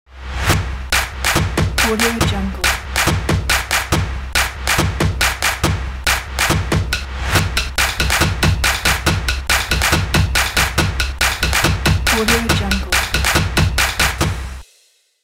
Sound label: upbeat drums logo; harutmusic